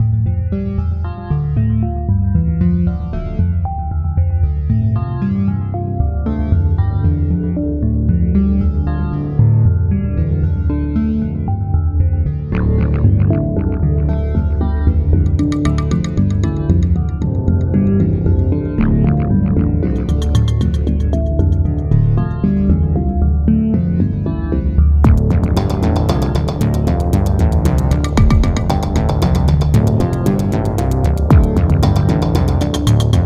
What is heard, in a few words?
Space Map Loop; Eternal Edge